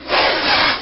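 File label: snake attack; DAoC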